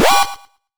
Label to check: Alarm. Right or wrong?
Right